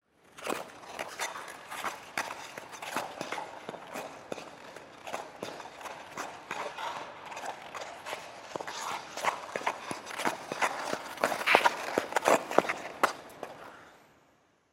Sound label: Ice Skate Foot Work, Ice Skate Foot Work Rink; Ice Skating; Sports; SoundEffects-Blastwave